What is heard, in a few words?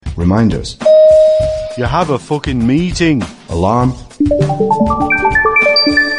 Alarm Meeting Ringtone